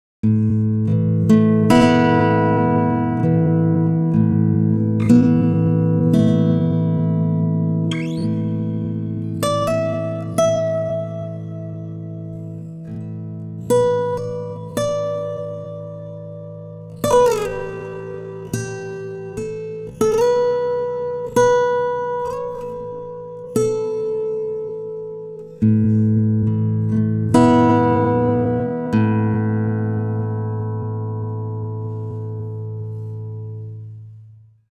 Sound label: timestretch guitar; usb uvi workstation